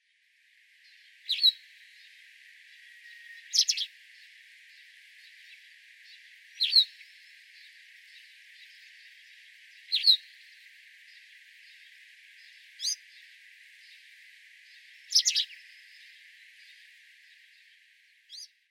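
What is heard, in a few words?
hakuseki, White Wagtail; utsunomiya; koe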